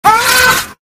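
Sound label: pain critical death; voice packs; scout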